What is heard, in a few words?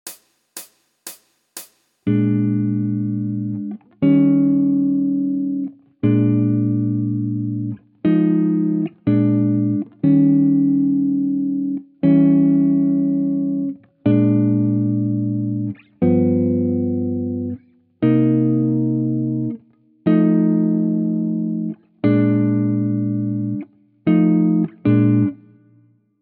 Jazz Chord Melody backing, Guitar; Guitar Techniques 2019 Issue 297 July